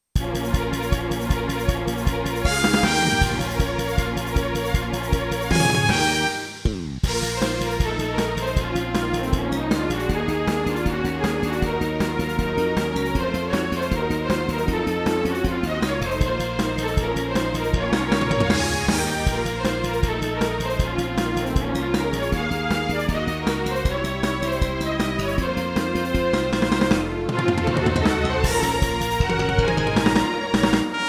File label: battle2